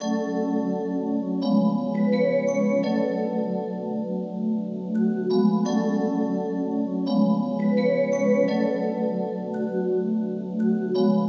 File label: 170 Gb Minor